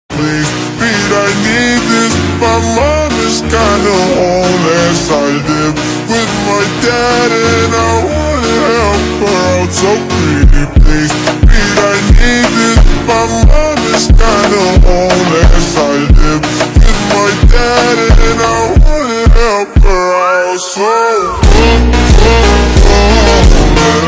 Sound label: FUNK Ultra Slowed